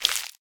leaf fall; 摇钱树; TREE

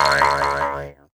bad condition; 摇钱树; ITEMS

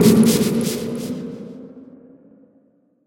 bonus spray activation; 摇钱树; ITEMS

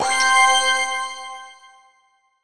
combo; 摇钱树

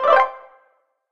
points count stop; 摇钱树

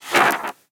magazine appearance; 摇钱树

